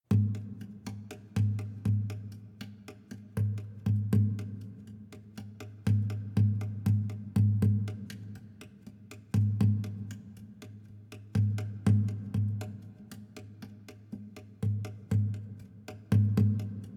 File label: Savannah Music